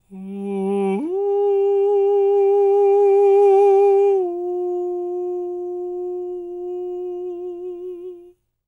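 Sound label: falsetto, Vocal Planet Jazz FX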